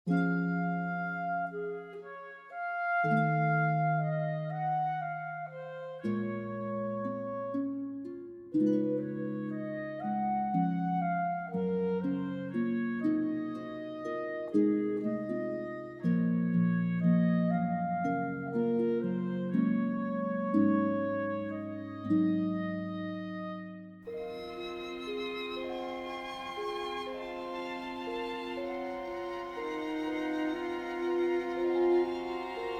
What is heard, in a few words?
Ocean Background Fantasia; the theme from tiktok ig